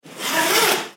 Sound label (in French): Fermeture zip